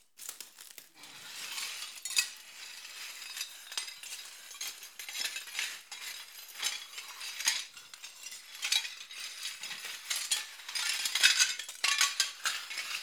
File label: Tiles Debris Movement Drop Slices On Ground Interior; The Soundcatcher; The Glas Smash Sessions